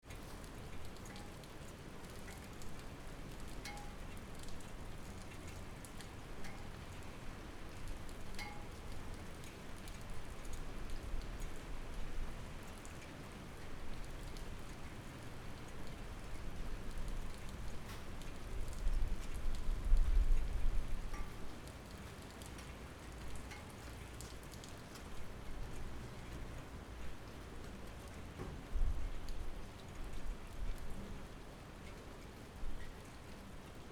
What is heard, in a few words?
5am small town light rain thunder; atmosphere